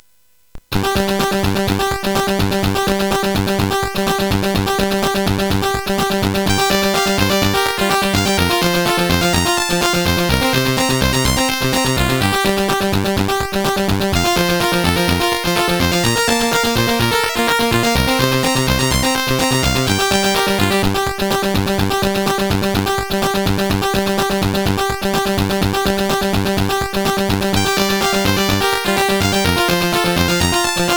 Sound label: Sanxion Loader, CSG8580R5; Sanden Peter